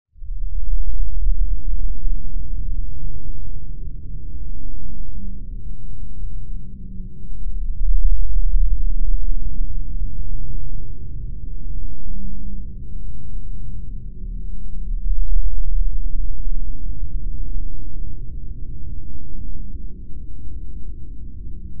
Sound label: Noir Ambience